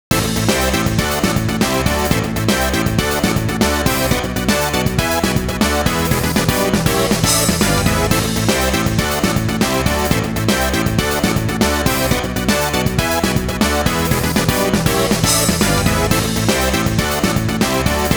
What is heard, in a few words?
Continue; nitroplus blasterz heroines infinite duel; arcade ps3 ps4 windows